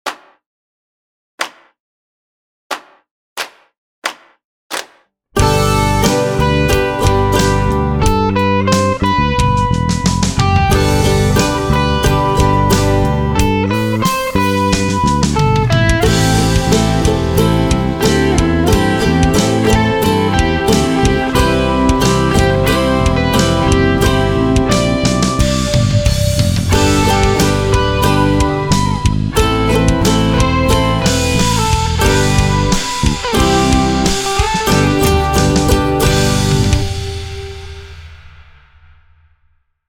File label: Strum Along Song